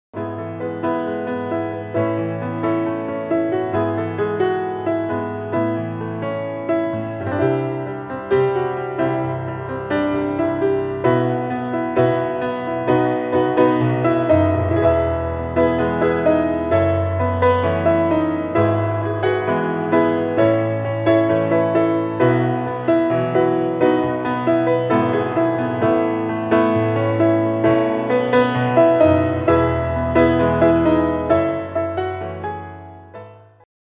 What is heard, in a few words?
Gentle Harmony